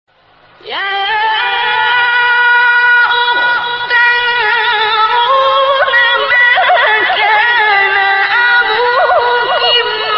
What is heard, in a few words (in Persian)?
تلاوت روحبخش آیات سوره; 28 30; مریم; عبدالباسط